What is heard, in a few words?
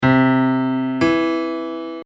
Interval 8